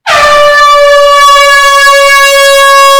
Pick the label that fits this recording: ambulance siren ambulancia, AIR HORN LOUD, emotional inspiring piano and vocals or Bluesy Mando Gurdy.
AIR HORN LOUD